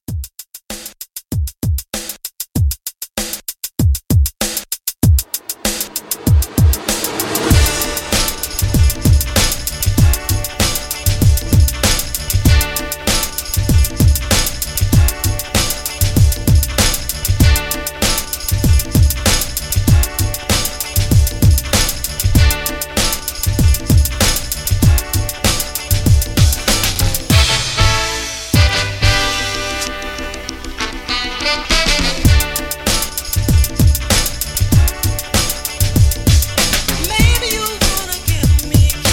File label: 60s Soul Re Drum